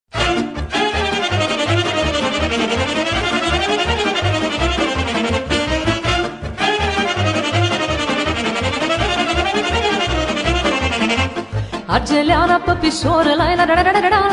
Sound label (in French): Ardeleana, Roumanie